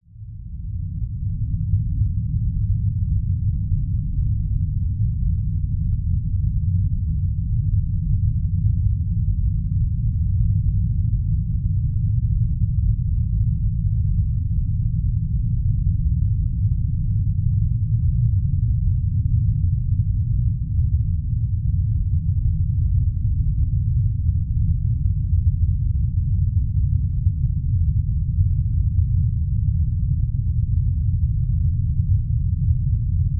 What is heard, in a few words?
science fiction space atmosphere drone rumbling airy outerspace